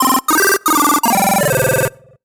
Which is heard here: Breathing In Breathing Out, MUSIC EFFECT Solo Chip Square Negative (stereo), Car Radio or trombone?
MUSIC EFFECT Solo Chip Square Negative (stereo)